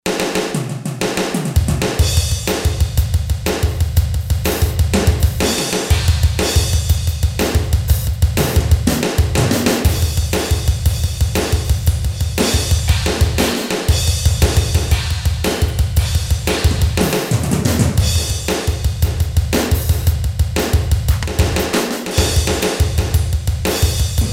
POWER, Roland SC-880 Rhythm Sets; Factory

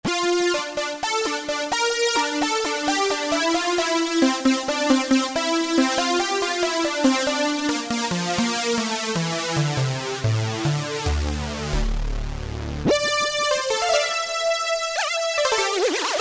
Rich Ld, Yamaha A4000; DJ - Producer Tool Kit

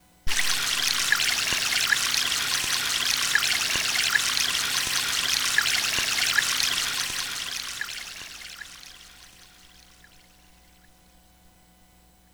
Emulator II - Wind Chimes - Birds - Stream

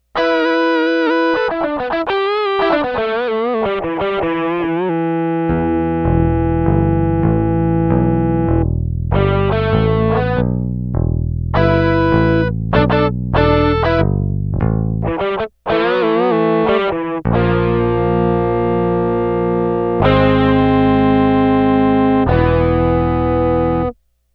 ELECTRIC BASS SOLO ROCK GUITAR

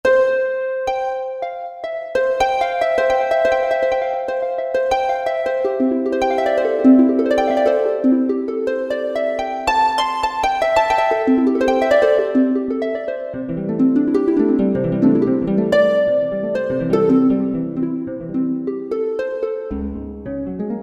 Plucked Harp, Roland SR-JV80-02 Orchestral

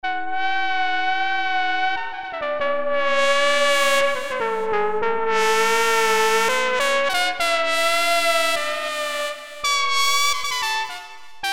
Acid Trumpet; Vintage; Green Oak Crystal

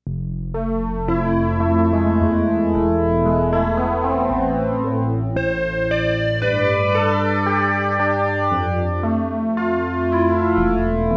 Flanged Piano

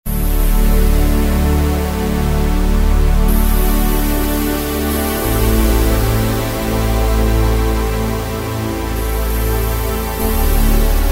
OXY Pad, Roland V-Synth; Factory Patches

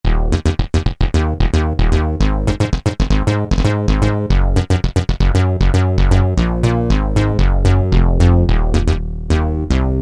Yamaha TG33; BA-Rezz

